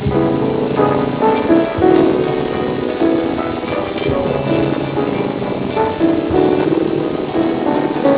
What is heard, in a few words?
musical